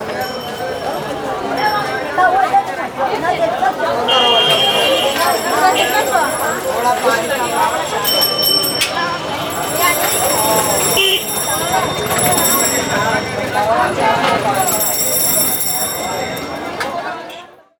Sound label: NEW DELI, AMBIENCE; USB Soundscan vol.45 - Indian Traditions; AKAI; AMBIENCE2